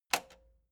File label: metal button spring click slow